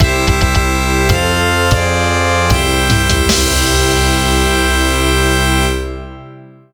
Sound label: Music Stage Clear; battle axe